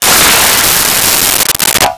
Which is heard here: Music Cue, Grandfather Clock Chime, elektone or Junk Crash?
Junk Crash